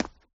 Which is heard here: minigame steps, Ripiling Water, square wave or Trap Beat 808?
minigame steps